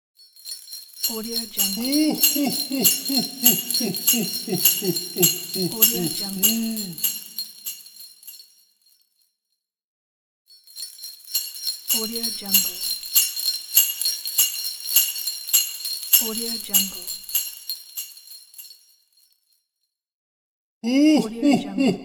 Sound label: santa laughing with sleigh bells